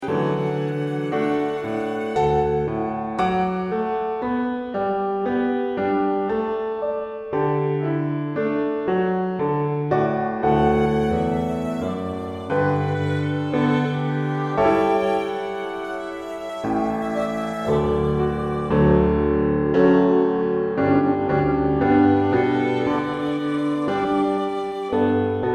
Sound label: ACCOMP